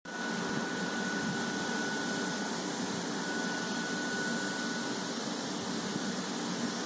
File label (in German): pfeiffen plastik